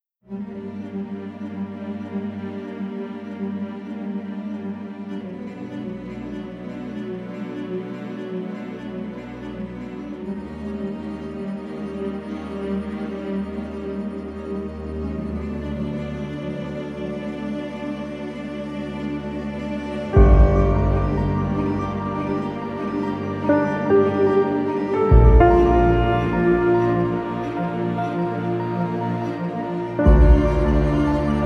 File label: Magical Intruige strings piano